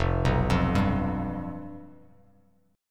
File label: F Suspended 2nd Flat 5th, down